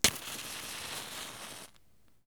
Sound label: match